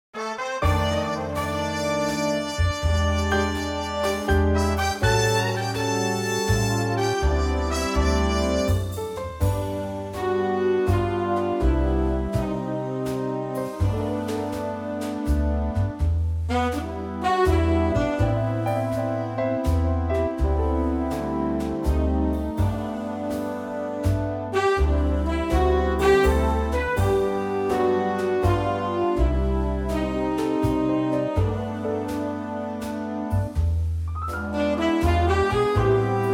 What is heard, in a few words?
G Big Band; vocal range - G to B